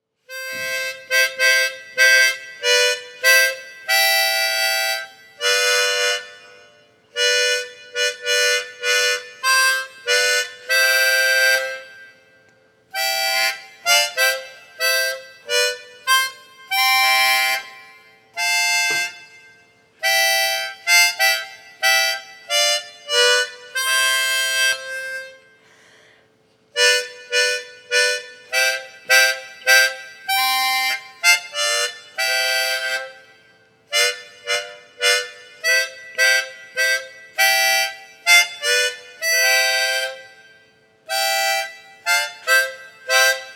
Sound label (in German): Suesser die Glocken nie klingen Mundharmonika